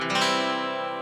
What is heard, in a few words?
guitar chord c major